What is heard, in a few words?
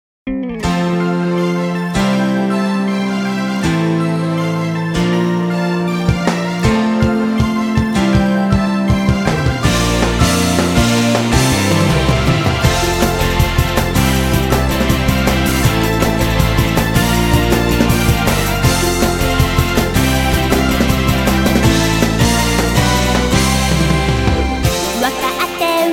Rainy Beat